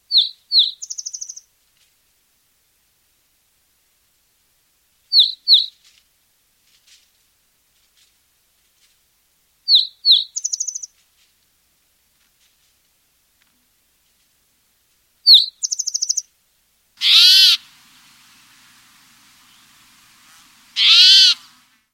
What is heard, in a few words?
spotted towhee